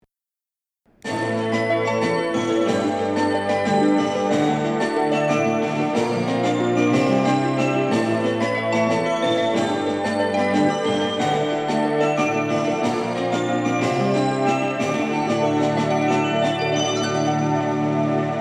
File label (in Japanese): 14番のりば発車メロディ（待合室の天井型で収録）; 新鳥栖